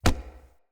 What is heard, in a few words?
Unarmed Punch Impact Wood Hollow Large